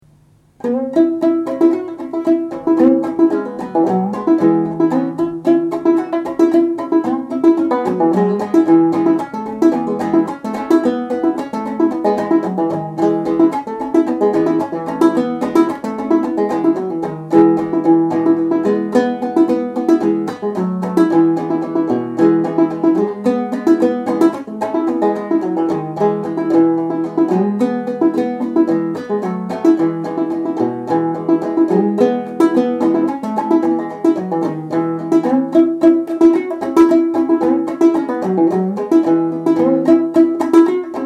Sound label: Gourd Boatman Gourd, gourd banjo